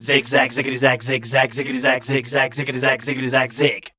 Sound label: scat, voice